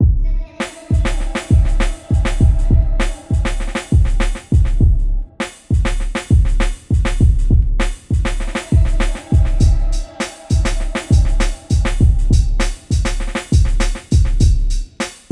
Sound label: DRUM